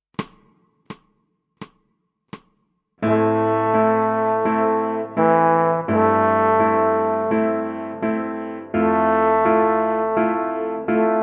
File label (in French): petit trombone et grand piano